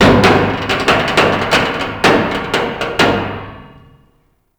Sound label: Derelict Metal Creak, Interior; Ambience; Warframe; Orokin Derelict